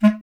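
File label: wind bass clarinet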